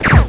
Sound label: UZI FIR